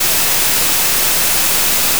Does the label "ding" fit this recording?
No